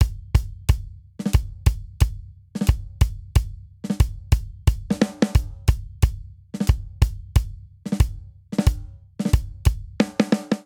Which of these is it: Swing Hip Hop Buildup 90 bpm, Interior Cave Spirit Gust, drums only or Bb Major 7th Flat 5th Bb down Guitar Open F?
Swing Hip Hop Buildup 90 bpm